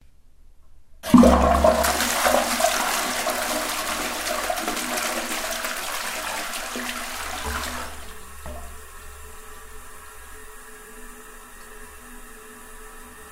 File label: long flush